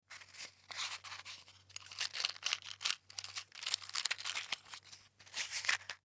Matches Remove, Firees Remove From Cardboard Box Open Close; Flames; Flame Sound Effects